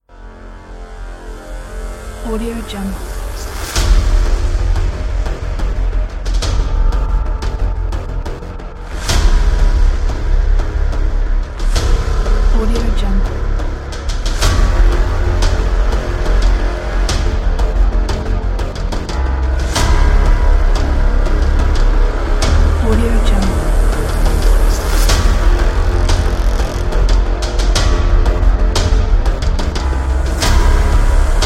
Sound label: hybrid drums